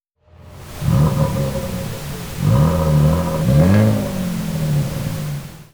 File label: Rev pink